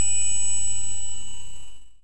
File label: tinkle